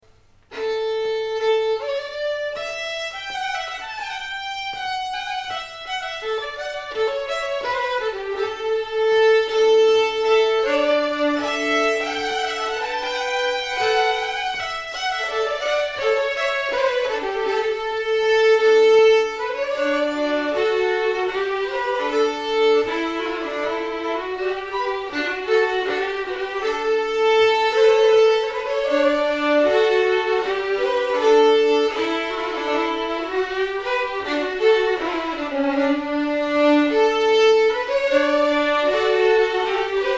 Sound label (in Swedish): polska